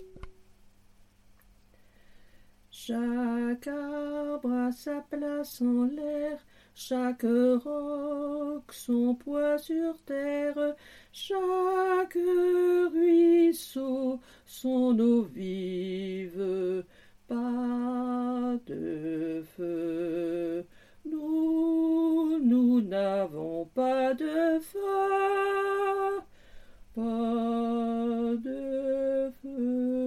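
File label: ténor; un soir de neige de poulenc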